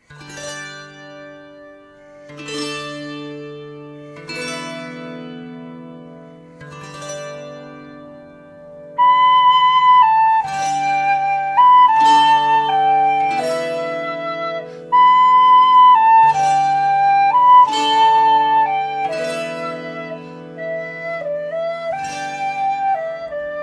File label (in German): Leier Bordunleier Choroi